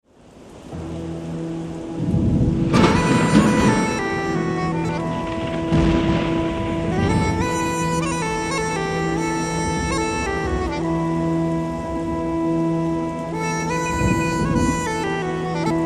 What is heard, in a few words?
pipe; intro sur synthé et uilleann pipes